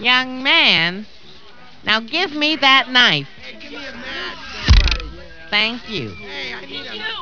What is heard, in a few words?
knife